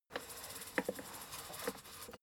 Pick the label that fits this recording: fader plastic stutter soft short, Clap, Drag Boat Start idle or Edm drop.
fader plastic stutter soft short